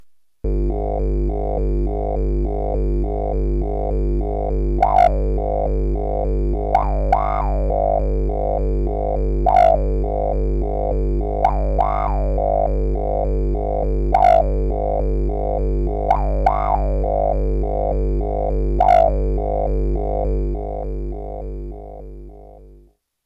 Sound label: twang; Scott Stites